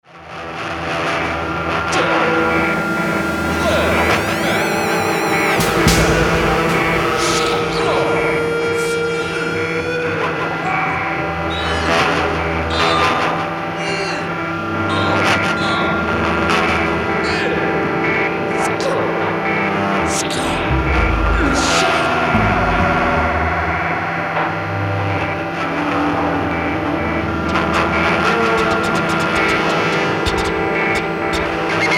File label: Theremin; atelierTheremin